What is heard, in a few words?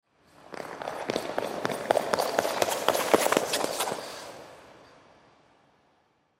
Ice Skate Run By, Ice Skate Run By Foot Work Rink; Ice Skating; Sports